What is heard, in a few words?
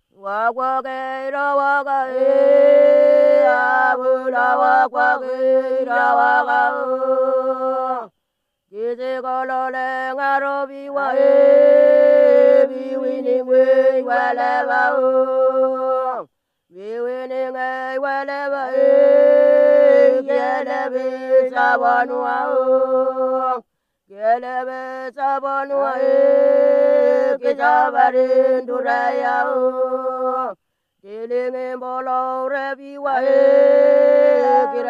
melanesia women, polyphony